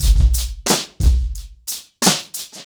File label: 90BPM, acoustic drums; The Roots Drumloops